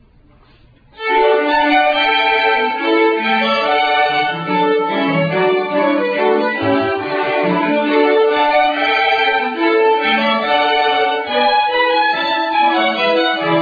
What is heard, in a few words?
gavotte, violine